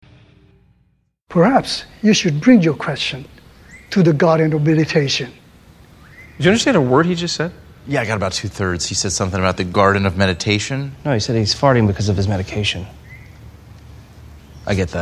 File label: Hangover farting; Hangover2